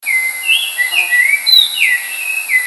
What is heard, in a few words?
Baltimore oriole